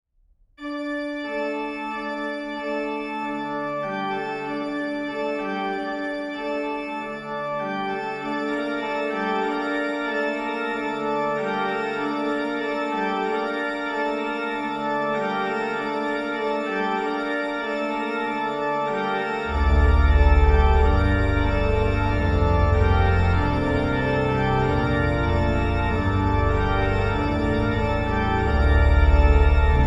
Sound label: campanarium; Campanarium (2016)